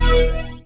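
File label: Hit Succeed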